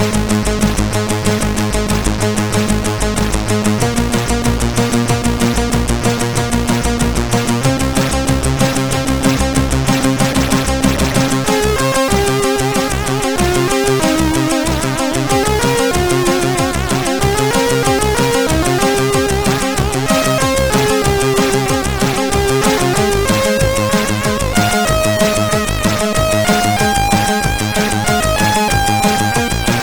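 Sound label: Ordeal, MOS 8580 SID chip music, games; CSG8580R5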